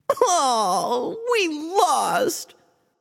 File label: boohoo; smite; tsukuyomi